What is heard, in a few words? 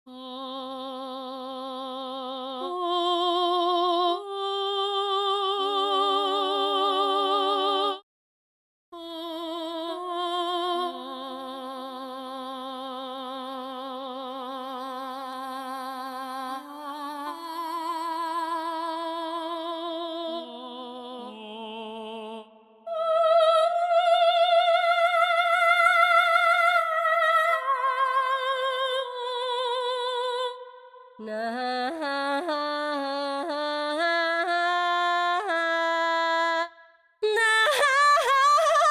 Voices